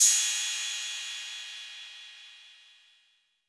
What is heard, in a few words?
Hats, Closed Hats